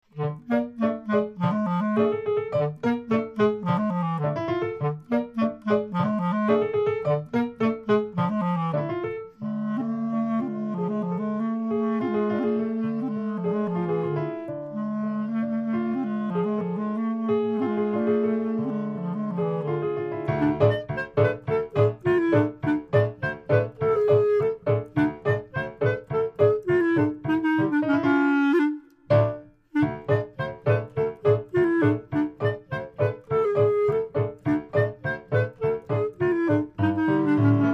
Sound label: nigun surprise